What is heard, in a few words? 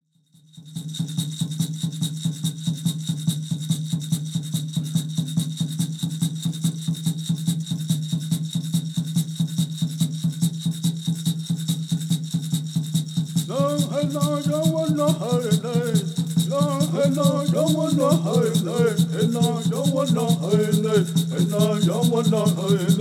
Peyote Song